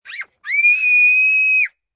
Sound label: Scream Pain, Scream, 1 child, high-pitched, scared, shriek, human voice, kid; Screaming Sound Effects; Sound Effects:Voice; VoiceBox